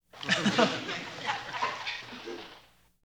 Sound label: crowd small audience